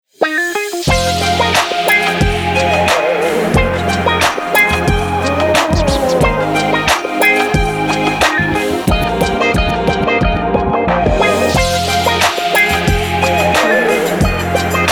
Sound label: hiphop podcast intro